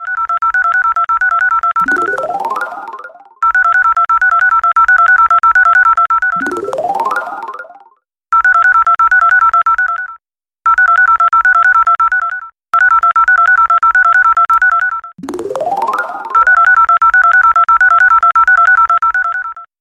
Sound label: wake up sound s5 ringtone